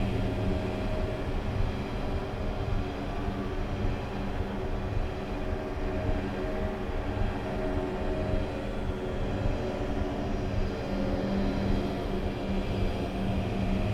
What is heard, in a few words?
Droid Factory Ambience; Lego Star Wars (PC)